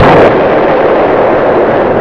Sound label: BOOM